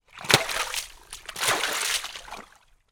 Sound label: footstep move in frozen water deep ice shards muddy; foley; zapsplat